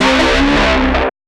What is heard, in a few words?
FX shot, Drum+Bass, 160; eJay